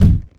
Explosion

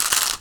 Marble Clatter

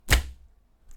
Meat Slap

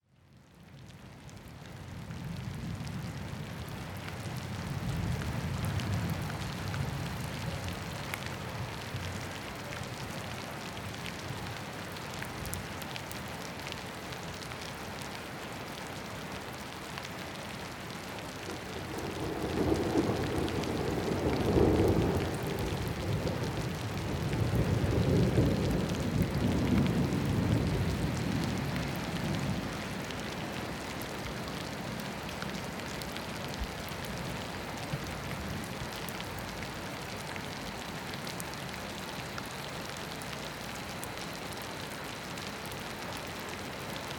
Storm

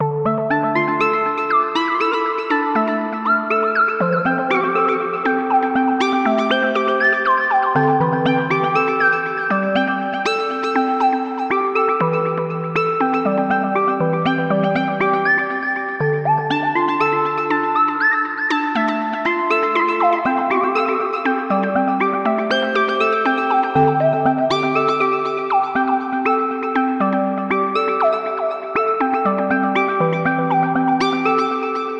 Melodic Arps

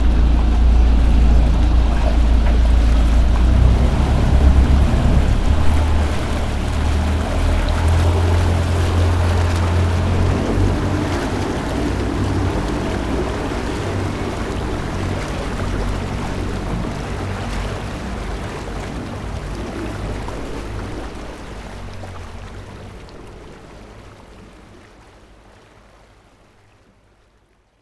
Slow Motor Boat Departure

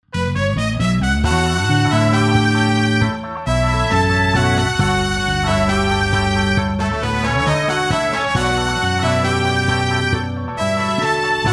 Tv Show Intro Music

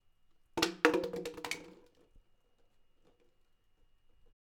Plastic Bottle Landing On Floor

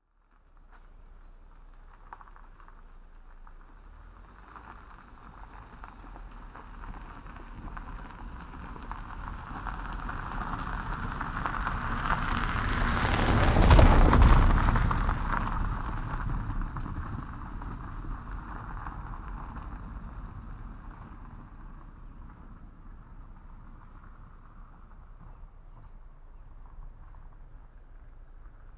Car Gravel Road Pass By Left Right

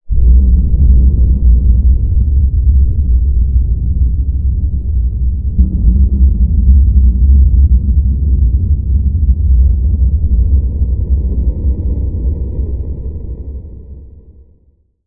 Sonic Boom

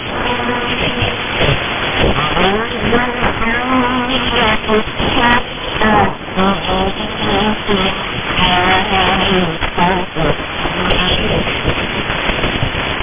Radio Oman Overlaps With Radio Japan

